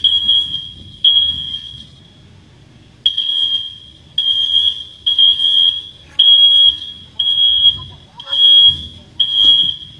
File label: fire alarm; Звук тревоги, предупреждающий о чрезвычайной ситуации